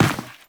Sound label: Step Dirt, Footsteps; Warframe; Teshin